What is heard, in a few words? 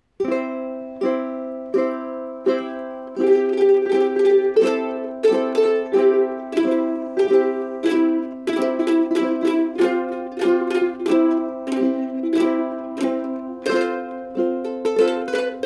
ukulele